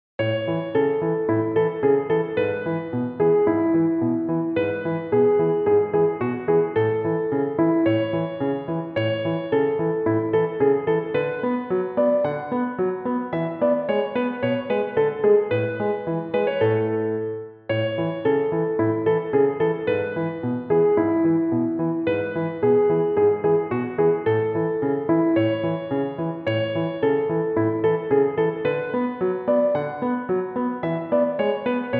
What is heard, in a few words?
Piano Solo Etude